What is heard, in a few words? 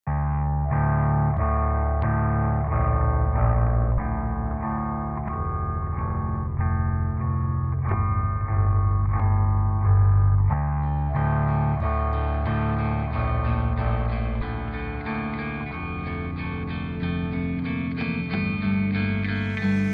Benz truck